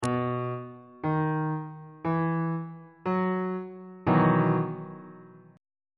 d double sharp major 7th suspended 2nd 2nd inversion